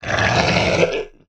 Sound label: NPC Killed